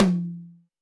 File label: Tom Hi Slam; Alesis D4